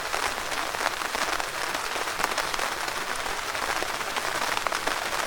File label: Rain on tent